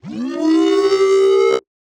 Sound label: Mid Robotic Charge up; SoundsCrate